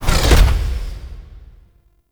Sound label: Interior Orb Station Door Large Close; Warframe; Tau; Orbital Station